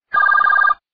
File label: Simple Business Ring